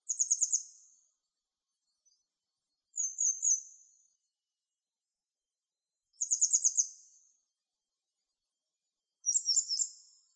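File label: kisekirei song, grey wagtail; saezuri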